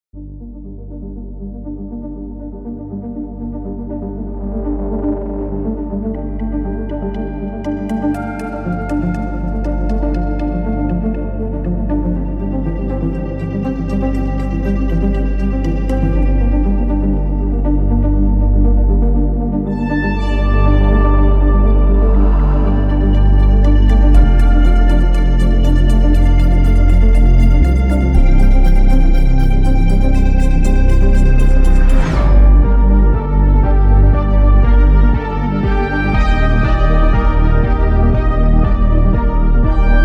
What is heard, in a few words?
leads